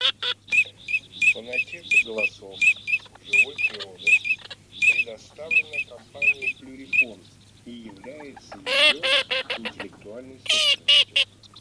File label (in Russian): Чирок-свистунок Alzavola; plurifon